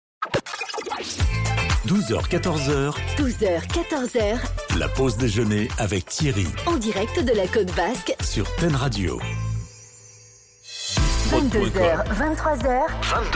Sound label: Radio Jingles